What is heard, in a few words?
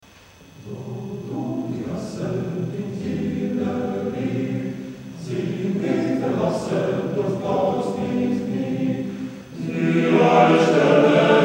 male choir, motet; male choir of mephi; tsaritsyno 16.10.2005